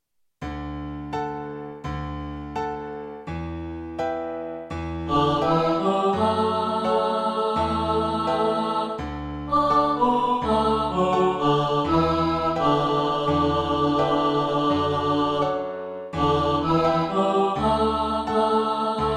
unison, choir

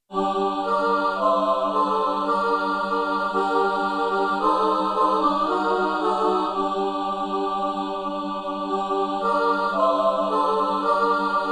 satb

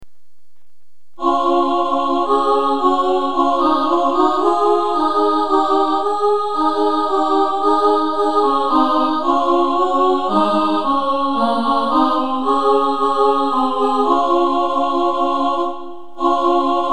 ubers gebirg sop alto, choir

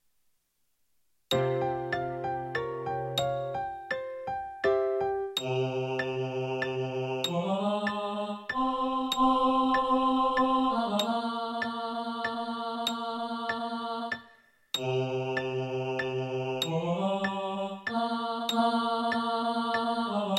tenor, choir

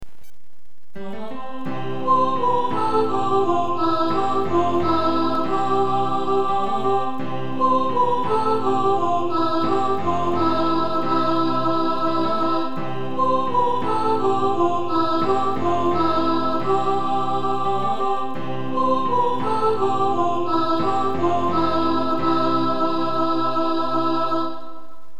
soprano, choir

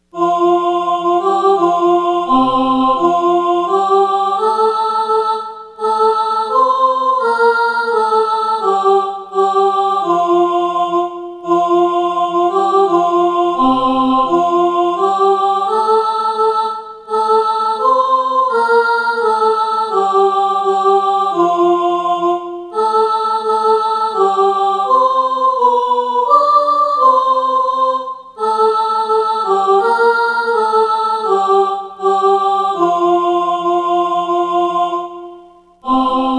alto, choir

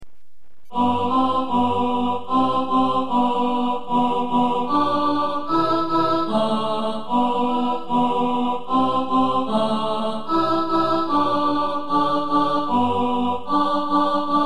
alto, choir

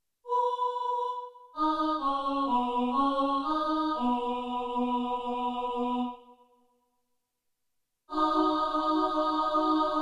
dawn alto, choir

